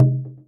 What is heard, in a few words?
Drum Circle, Percussive; Ableton; Factory Packs; Synth Essentials; Collision